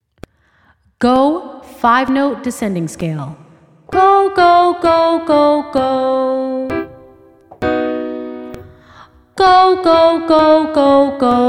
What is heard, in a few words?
go 5 high range, vocal exercises